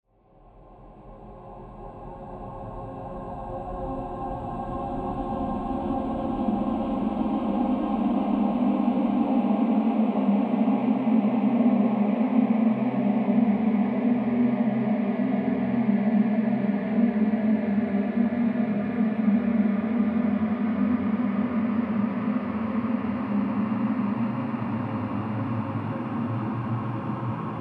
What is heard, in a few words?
Drone Winter Ambient; Lensflare; Winter Ambient Sessions; Dronologic